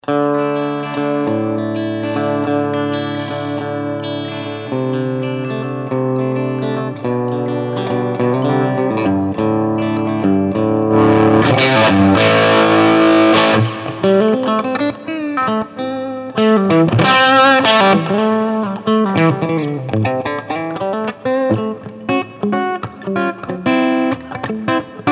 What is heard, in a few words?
dirty, amp; micro champ